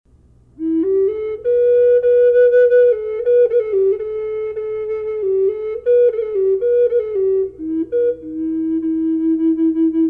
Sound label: E raven reverb, flutes; collector flutes